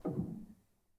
rel, Grand

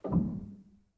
rel, Grand

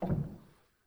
rel, Grand

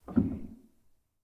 rel, Grand; bitKlavier; Binaural

